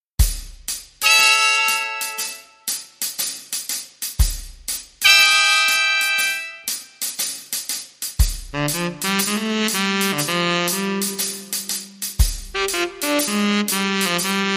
Horns & Drums

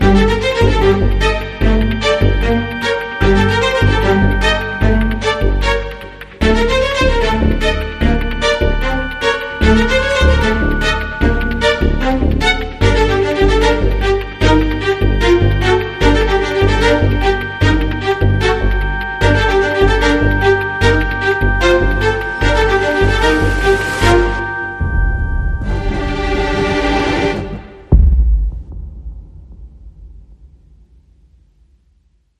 Emotional Dissonances, No Drums

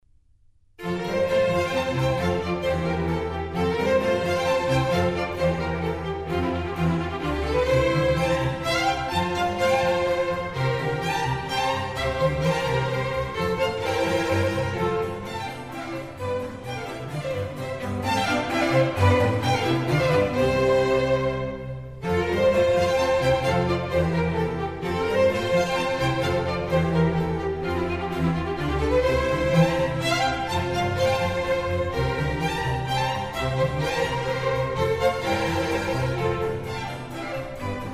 Concerto for Strings in F minor; RV142; Antonio Vivaldi

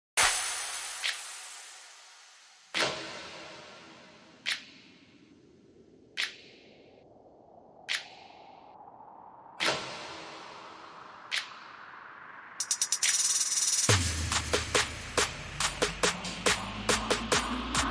Enchanted Trap Bass Drums and SFX Only

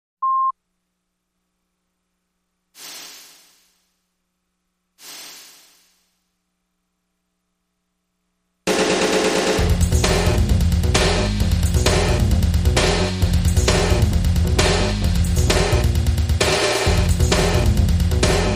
Drums & Bass

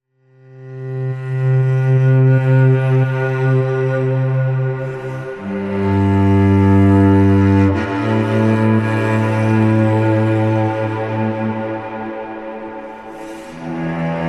Cello

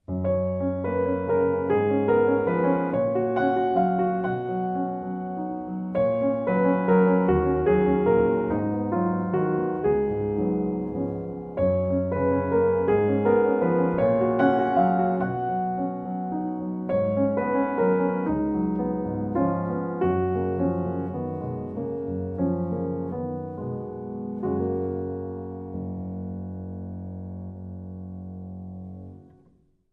Solo Piano; 30sec

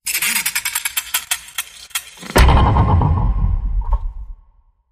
Broken Device Hit

